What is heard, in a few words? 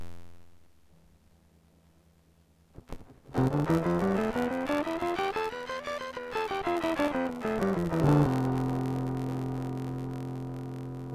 C scale distort